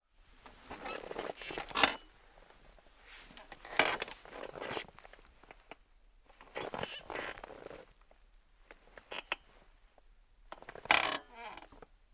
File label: Creaking Furniture